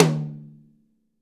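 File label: mid tom rimshot; 90s sample CD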